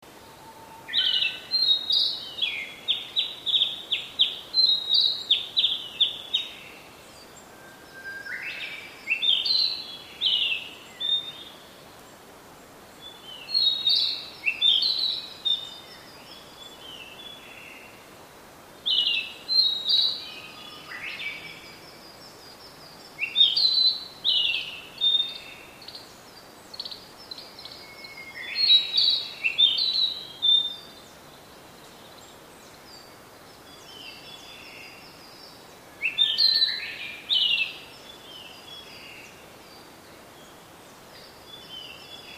kibita

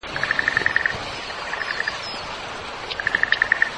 kaeru

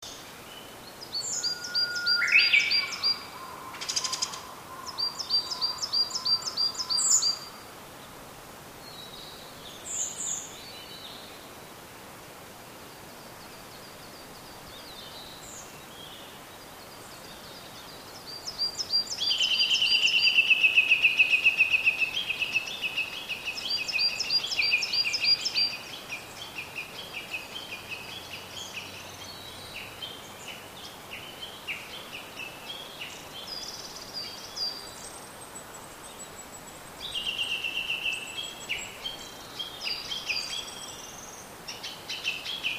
uguis

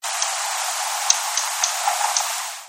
ojirobita

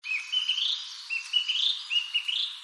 kibita

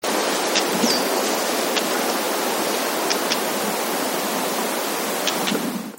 nobita

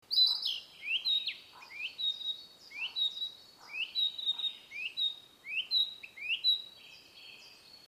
kibita

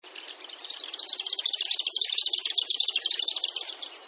osekka

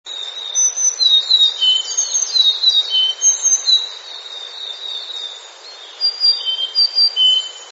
kogara

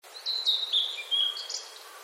oruri

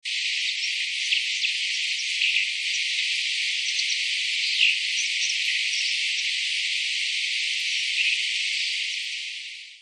kawagaras

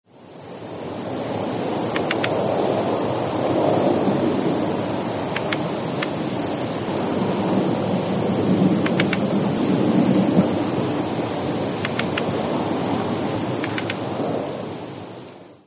joubi

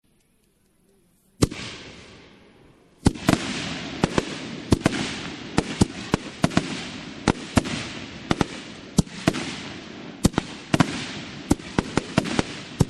hanabi

